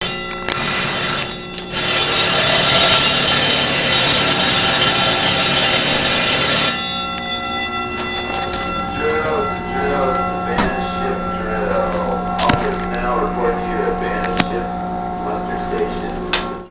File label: drill